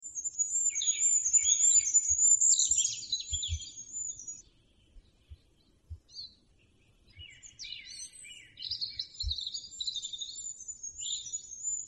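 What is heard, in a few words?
goldcrest voice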